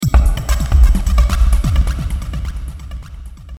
Rave drum loop

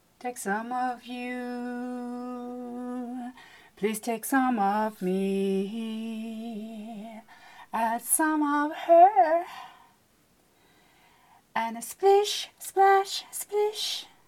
Emergence Sea with MMT (Clean recordings)

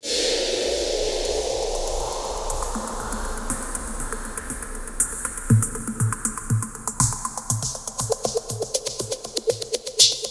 Pongbient avec Drum, drum (wet)

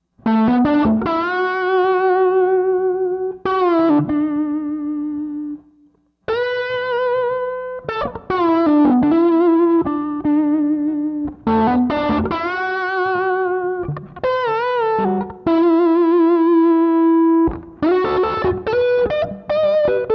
Lead guitar

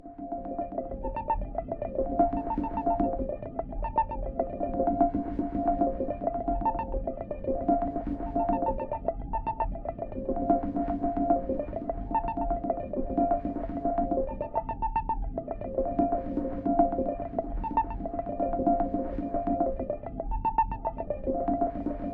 120 Noises Ambient Samples

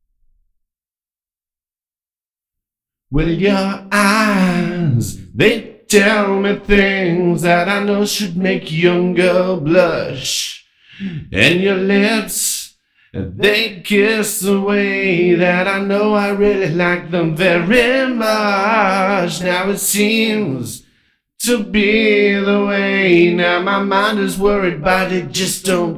Young girl blush (A Capella)

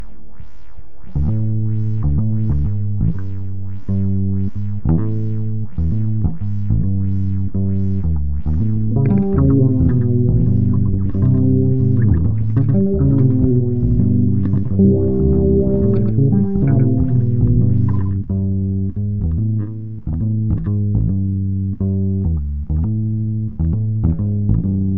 Nose Picking Bebop

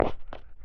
contact perc

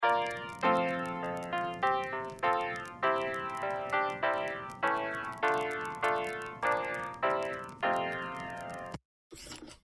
old timey record

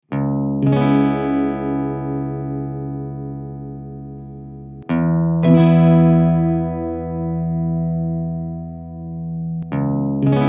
C m100 GTR impro

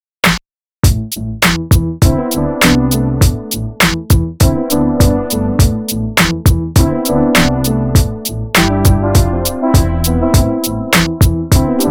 macfunk

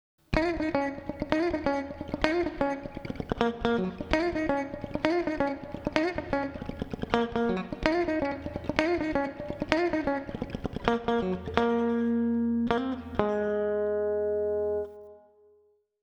PIGEON FUNK

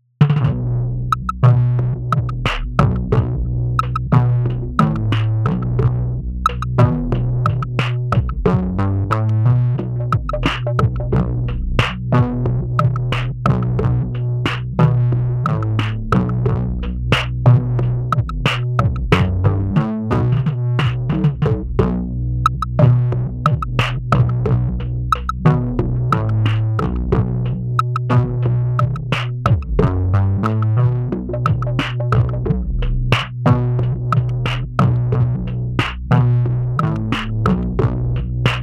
90 Rhythm Section fx

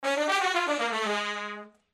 brass loop

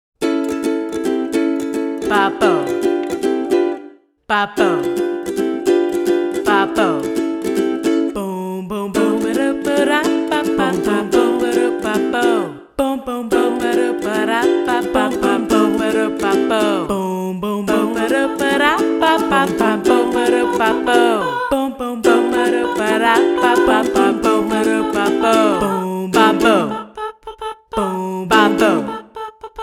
Mouth Sounds and the Magnificent Uke

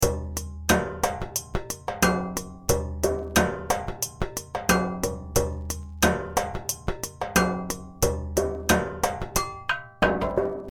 beat

